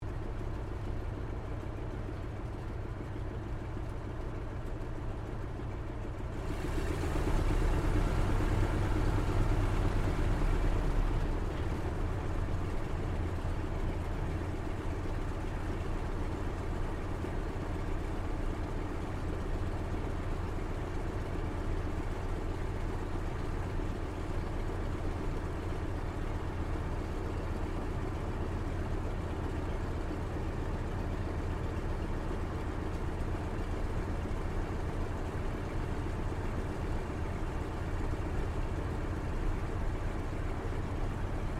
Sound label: AIRCRAFT CAC WIRRAWAY TAXI INTERIOR; CA-16 Wirraway; Airplanes; Vehicles; Aircraft Collection; Twistedtracks